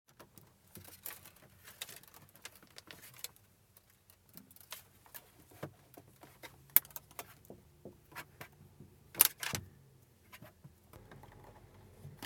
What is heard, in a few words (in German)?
Navi anbauen